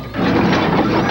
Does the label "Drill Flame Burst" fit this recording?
No